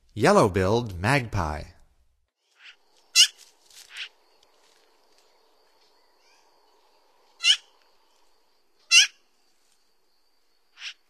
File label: Yellow-billed Magpie, Songs of Birds of North America; Stokes